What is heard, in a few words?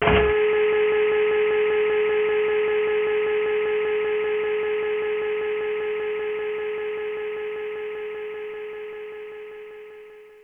Cannon